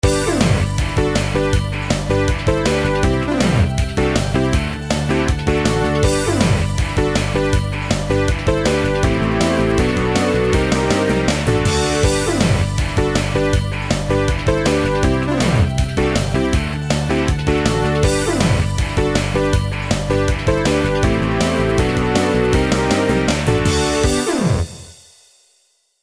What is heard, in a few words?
synth brass